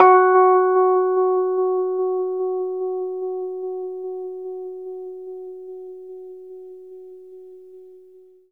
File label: Rhodes octave F#, L; 3D Sound; 3D Keys VOL-1